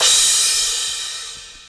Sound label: Crash, Cymbals; Vengeance Samples; VEH3 Cymbals